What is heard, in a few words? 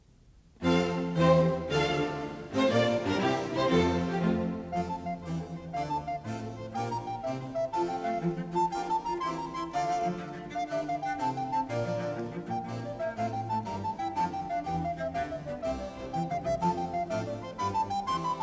Recorder Concerto; Handel; Op4-5; Marriner